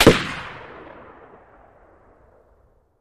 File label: Gun Shot Sngl Ext